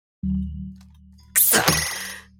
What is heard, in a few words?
immortal object tone a3; sao